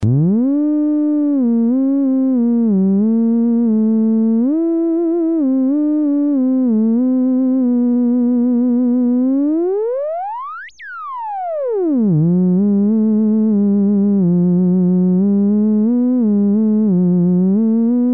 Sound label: wave, Theremin